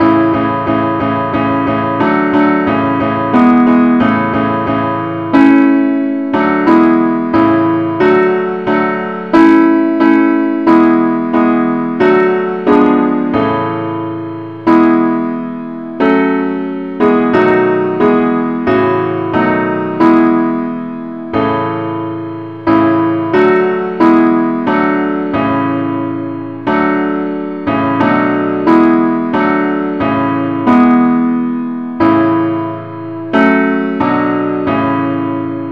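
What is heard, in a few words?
simple accompaniment, key A; christe Sanctorum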